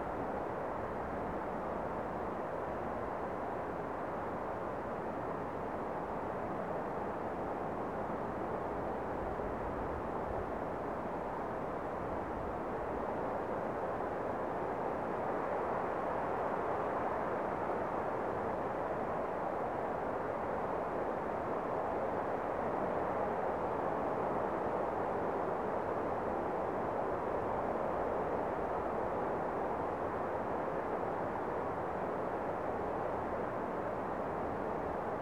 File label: WIND LOW; SIMUZENSOUND; BOUNCE